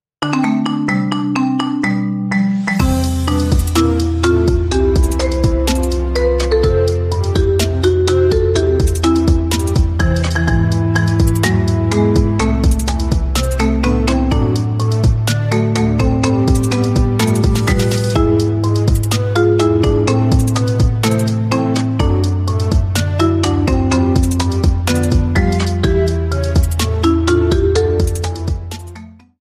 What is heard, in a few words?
Marimba Remix; Dragon Quest; ドラクエ (Marimba); 着信音